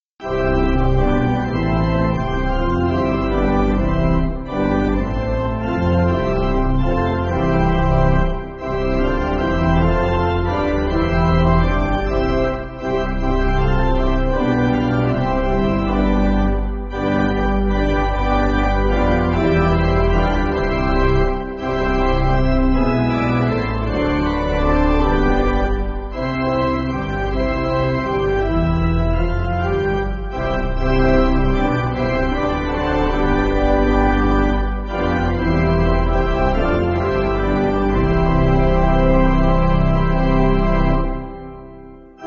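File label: Pipe Organ, Music Backing; Pelham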